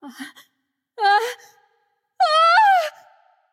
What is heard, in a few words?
fear light; smite; voicepack; hel